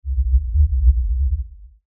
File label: Lfe Rumble By Swell Low Bass Movement; Rumbles; Sound Effects:Sound Design